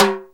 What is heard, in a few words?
TIMBALE HI; Back In Time Records - Big Bang